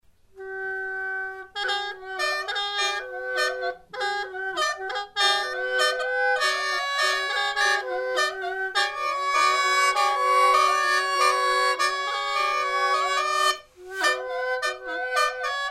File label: Meuneu fulu, free reed pipes duet the sound is more dissonant than in other Lahu music I have recorded